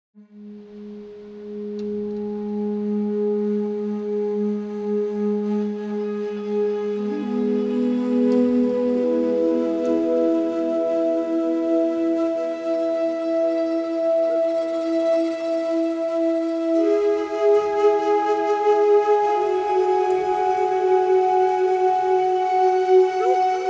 flute warm; Muzyka Ilustracyjna

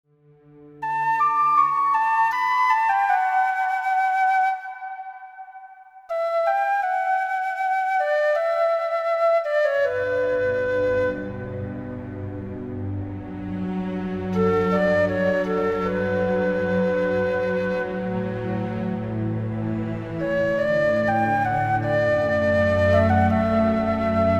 Church Skype; Muzyka Ilustracyjna

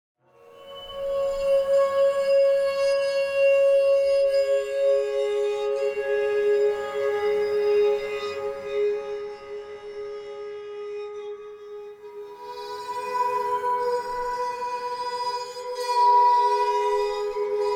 waterphone; Muzyka Ilustracyjna

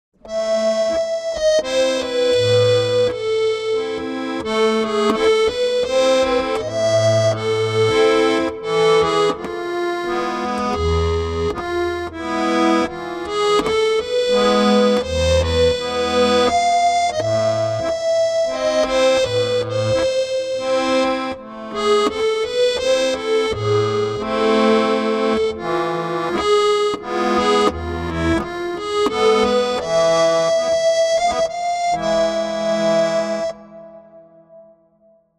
musette; Muzyka Ilustracyjna

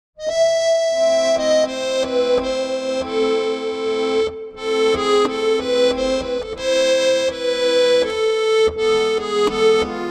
musette; Muzyka Ilustracyjna

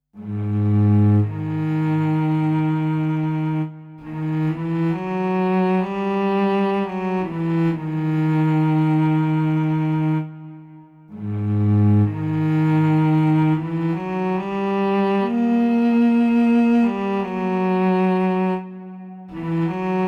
viola; Muzyka Ilustracyjna

